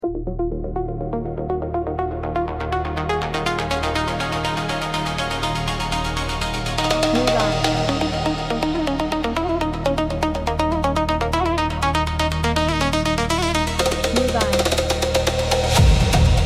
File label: Greek cyberpunk electro